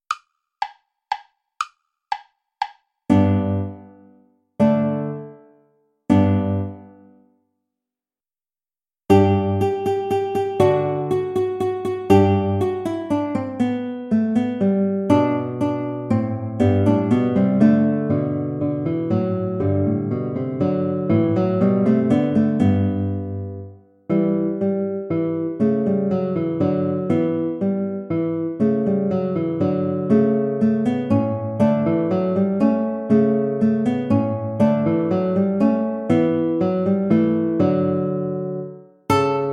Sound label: alter ungarischer tanz play along without flute